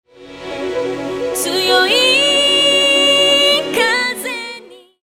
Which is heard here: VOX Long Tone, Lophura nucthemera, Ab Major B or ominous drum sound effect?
VOX Long Tone